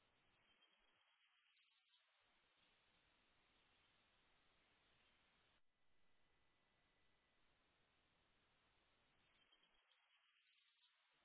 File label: Prairie Warbler